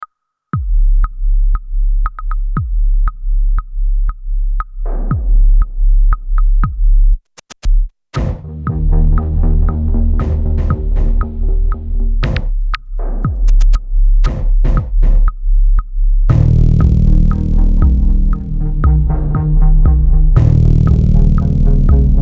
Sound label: distorted visions